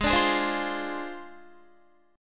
Ab 6th Flat 5th, Guitar, Ab6b5 chord; Ab6b5 strummed; JGuitar

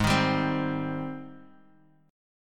Ab Major 7th Ab, Guitar Open D; 6,6,5,6,x,x; down

